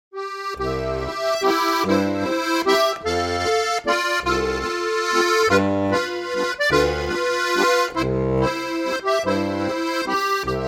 Walzer

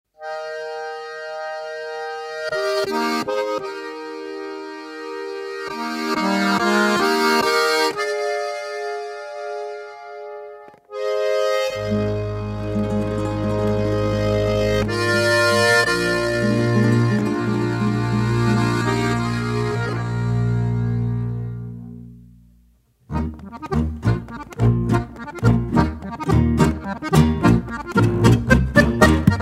Almerisch Landlerisch

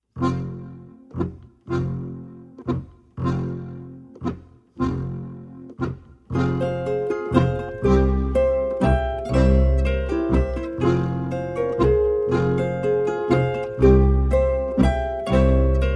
Perchtentanz

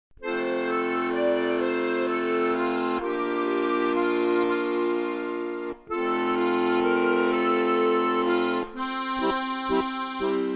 Harmonika Suite

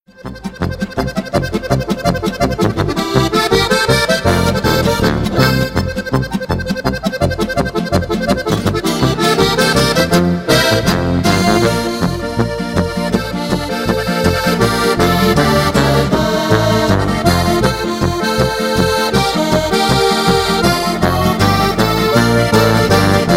Harmonika; Harmonika Grand Prix

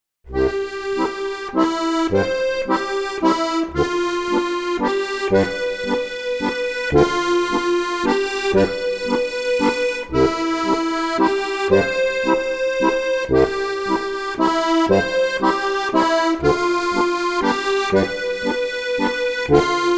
harmonika

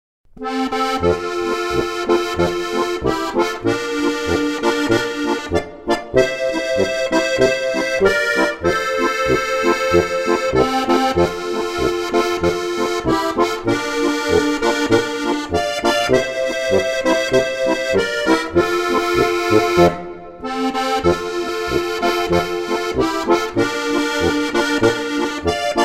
harmonika

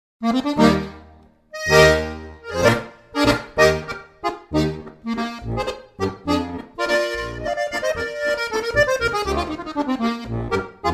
landler

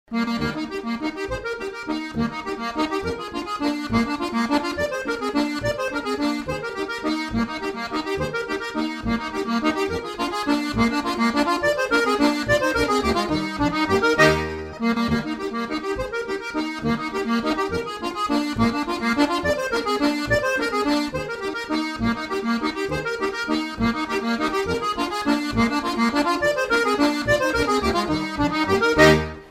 landler

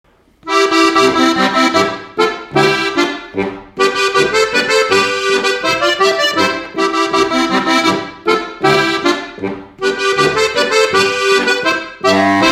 Boarischer

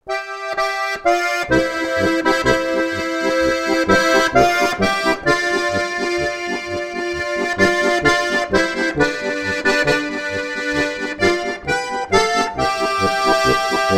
Polka

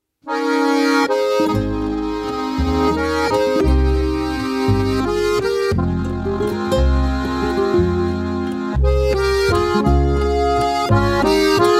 Walzer

